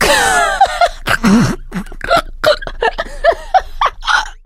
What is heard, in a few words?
laughter, survivor, voice